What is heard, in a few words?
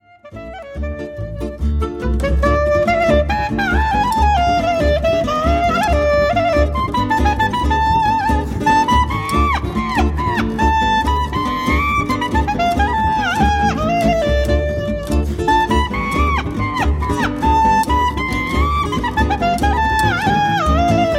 klezmers freilach